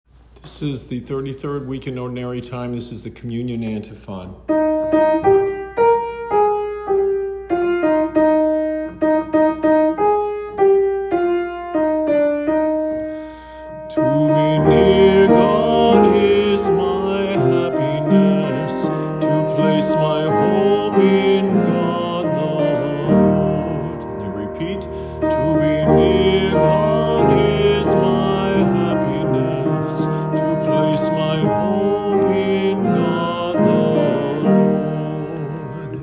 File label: Communion Antiphon; childrens music